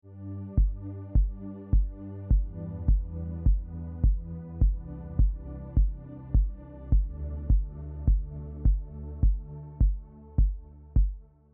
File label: pumping, sidechain; Waves; 侧链抽吸; Waves 侧链技巧大进阶，不止于抽吸和闪避